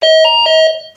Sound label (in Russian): Звук открытия домофона Цифрал; русские, на уведомление, звуки, уведомление, на смс, короткие